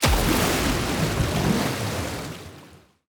Tidalwave Shot

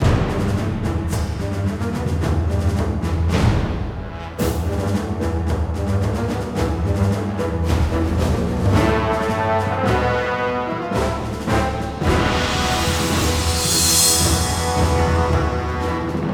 Boss combat; The Hobbit; PS2

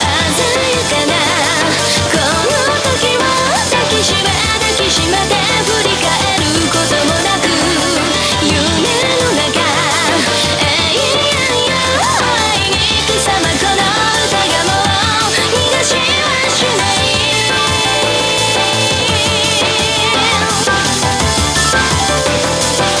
Bgm Reach

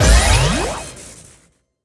Pick Up Battery; Subway Surfers City; Android, iOS

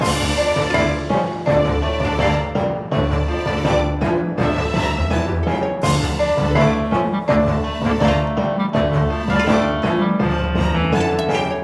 Winter 165bpm; Don't Starve Giant Edition; PS Vita

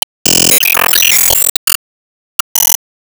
Ingame Result Stageclear; disney star smash android ios mobile gamerip 2020

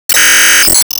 Enemy Waterplant Active

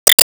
Se Enemy Eggholder Active

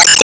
Enemy Bigflame Active Shot

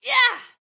Spin; Going Under; Jackie

